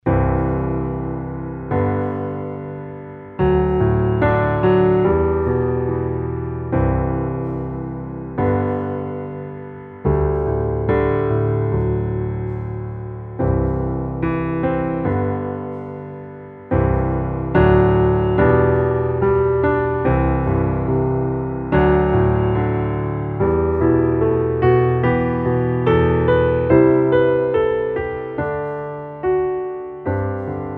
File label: Underscore PIANO; Worship Flow